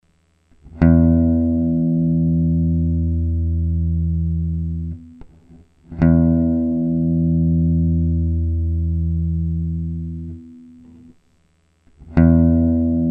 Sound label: low E